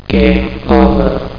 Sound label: GAME OVER